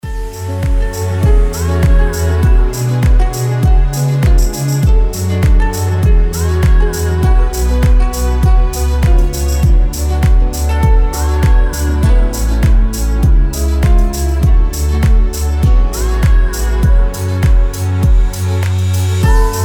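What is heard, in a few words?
ringtone nostalgic melody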